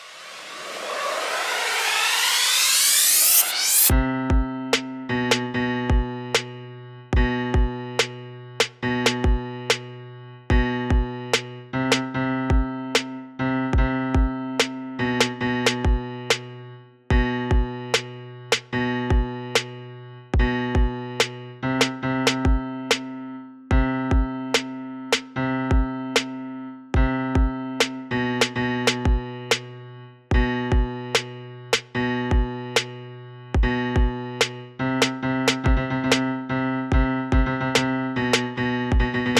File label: instrumental